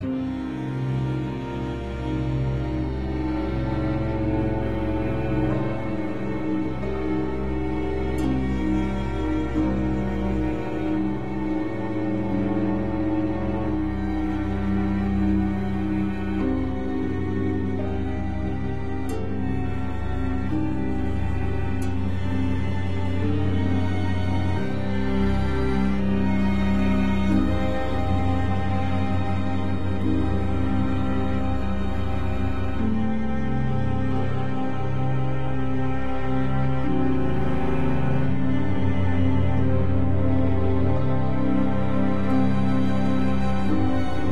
Hopeless loop

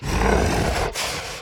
Walk Cycle Burns; The Town

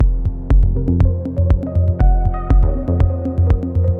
boss loop

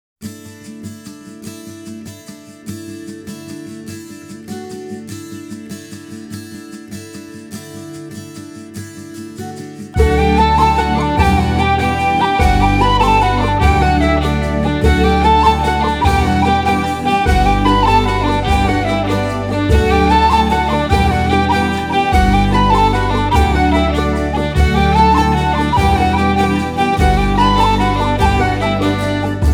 Carried on Rippling Waves, celtic; genso suikoden music collection celtic collection 1; Lakewest BGM

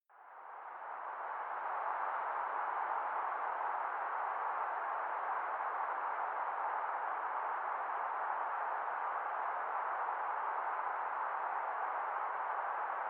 Street Amb

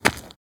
run gravel

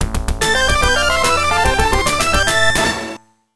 Perfect! (Bonus Stage Clear); Shadow Dancer; arcade

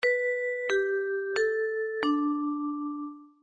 Chime

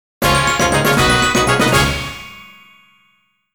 Jingle - Quest Report; Tales of the World Radiant Mythology

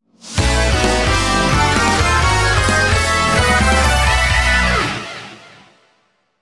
Game Clear Jingle; tokimeki memorial 2

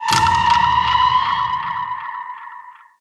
SPELLS Fear

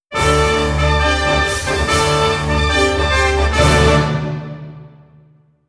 Information Fanfare; Metal Gear Acid; Acid2

